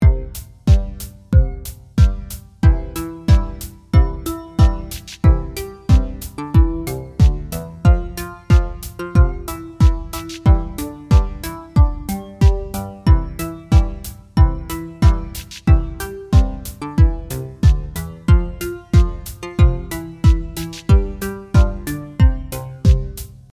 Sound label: Jingle Bells